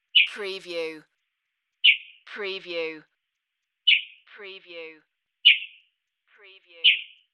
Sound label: Mocking Bird Chirps